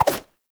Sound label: Run Whoosh, Foley, Footsteps; Enemies; Warframe; Lasrian Soldiers; Lasrian Butcher